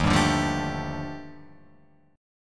D sharp Major Sharp 11th D sharp down, Guitar Open F; strummed; JGuitar